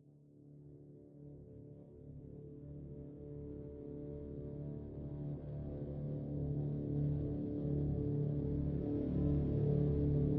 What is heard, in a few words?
Mystical Orient Intro part